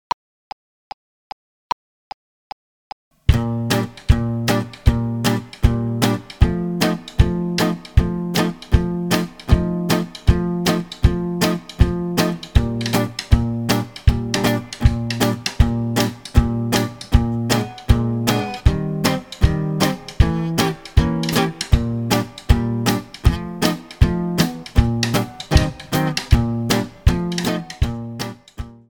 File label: two beat; just a closer talk with me